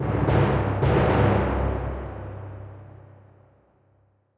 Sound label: Creep Tymp